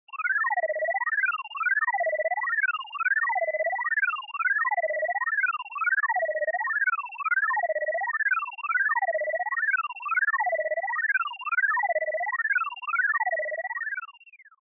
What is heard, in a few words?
Retro Anime System Low